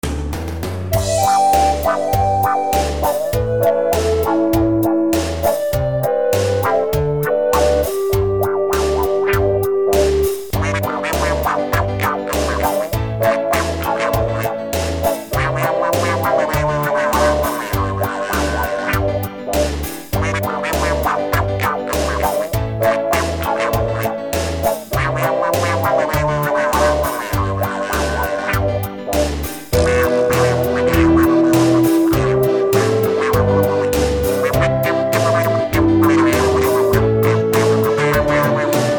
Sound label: wahkazoo